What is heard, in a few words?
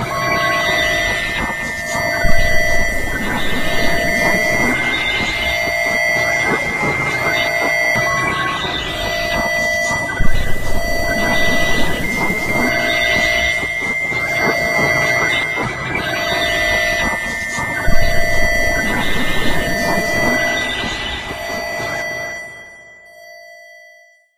robo stun; video games; source engine; tf; mvm